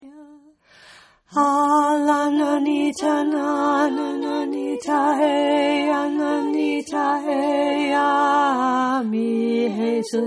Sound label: Tenor